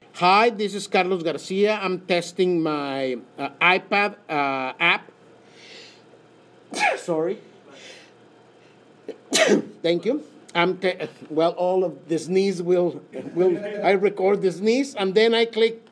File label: sneeze